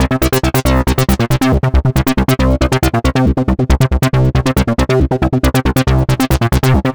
ACID TECHNO

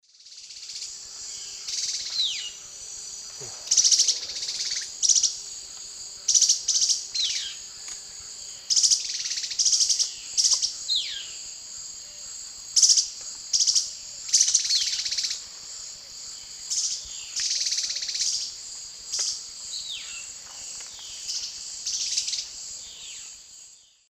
Schistocichla leucostigma, calls; S. America; Eastern Lowlands

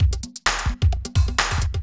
electro kit 130

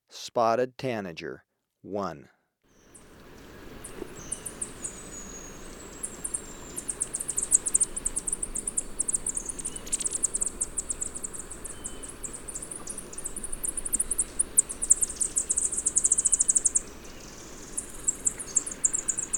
Spotted Tanager, Birds of Ecuador; S. America

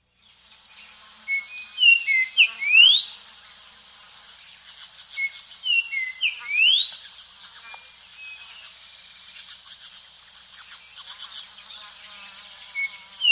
Grayish Saltator; S. America

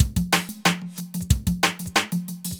conga hop

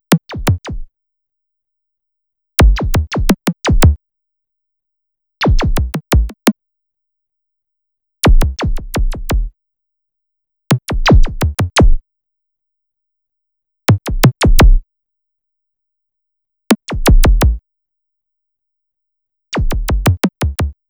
Synth, Rock

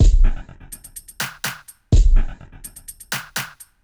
afrobeat 125

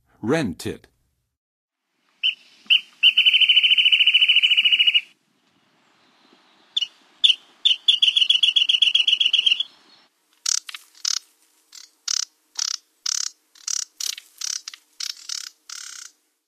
Wrentit; North America; Western USA; Western USA Songbirds

